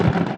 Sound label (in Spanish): V6 EXT BAJA MARCHA; AOS F1 2022